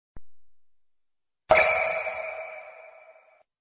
sms ringtone water droplets sound message ringtone